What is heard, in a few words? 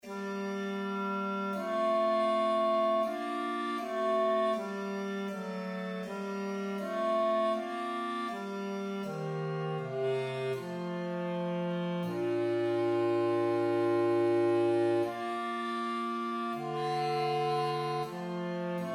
Bass; BC10-7 Lob und Preis sei Gott